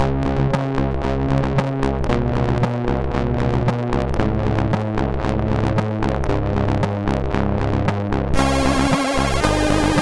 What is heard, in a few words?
March sid MOS6581R4